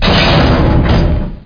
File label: press